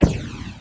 jet bad; Mafie 1

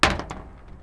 metal; Mafie 1

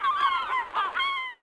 gull; Mafie 1

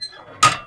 peep cl; Mafie 1

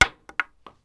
wood; Mafie 1

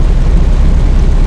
arrow; Mafie 1

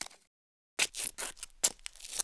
cig; Mafie 1

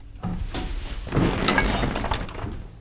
car sit; Mafie 1

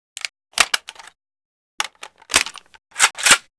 tommy; Mafie 1

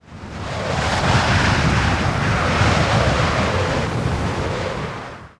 win; Mafie 1

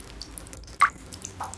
drop; Mafie 1

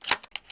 shotgun; Mafie 1

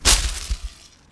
bush; Mafie 1

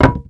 wood; Mafie 1

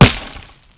bush; Mafie 1

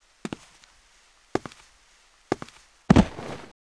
pad; tompad; Mafie 1

